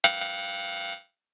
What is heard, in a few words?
jammed